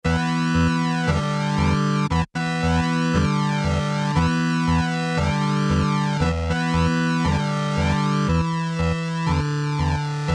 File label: Army Walking Theme, Game